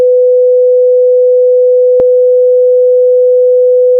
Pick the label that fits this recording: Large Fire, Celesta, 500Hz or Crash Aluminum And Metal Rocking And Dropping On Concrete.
500Hz